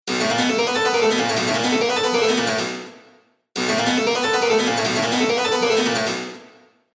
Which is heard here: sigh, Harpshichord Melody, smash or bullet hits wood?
Harpshichord Melody